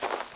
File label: pine step; Golden Crayon Sounds